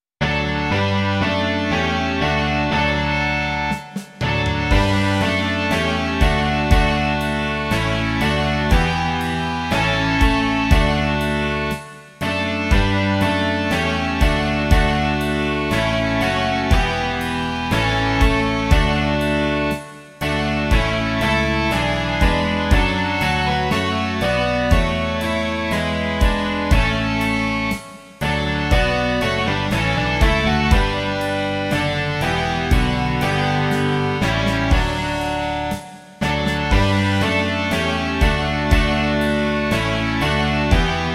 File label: rock